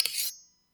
machete vs spatula

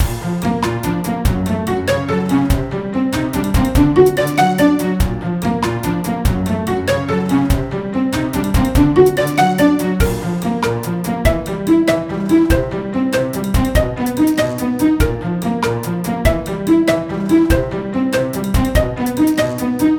orcs mage original

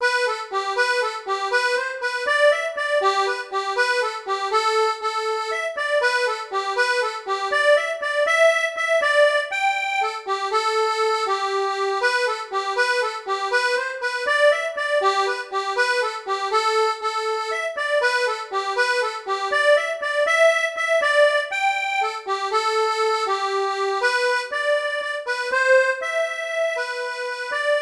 jig, Akkordica Anglo-German concertina; Oscar Woods; VST

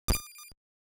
collect wpn; Jay The Doggo

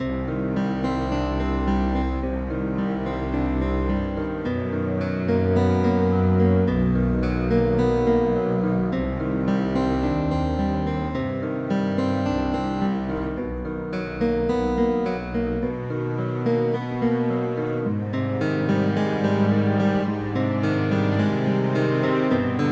Game Music Forest Theme

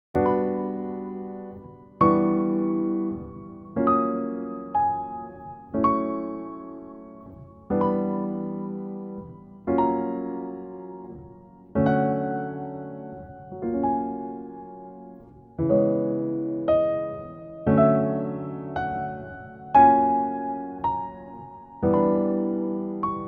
emotional no tritones compressed

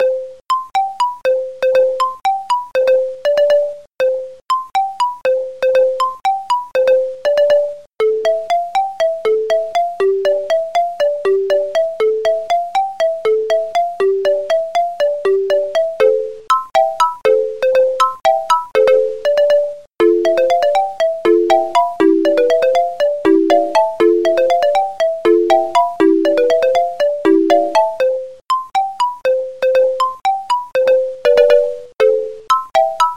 happy melody

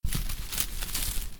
moving leaves stereo

